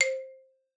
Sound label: C4, xylophone; Xsample VOL-4